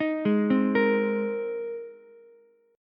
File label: Ab Major Add 9th, Ukulele; arpeggiated; 8,8,8,13